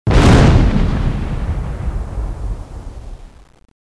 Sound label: explosion